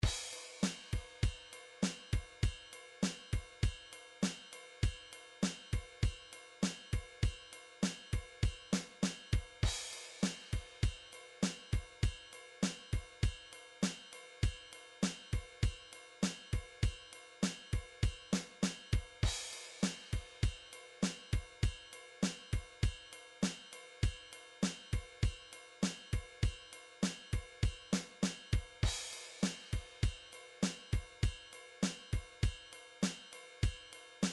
drums rock, midi drums

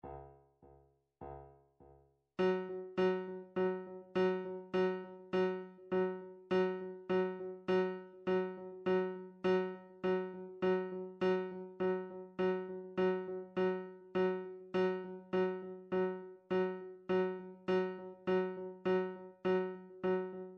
drum trax per

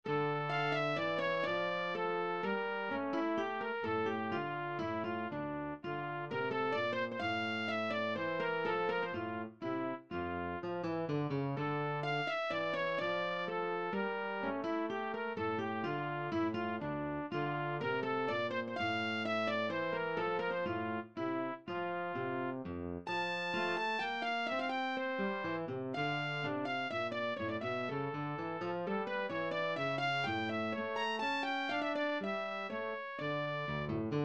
classical minuet, midi

